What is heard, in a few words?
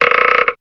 creak